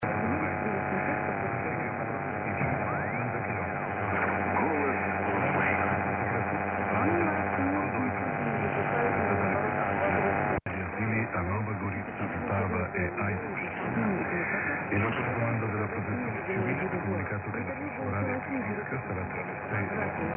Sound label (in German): Mittelwelle Diverse; 1170khz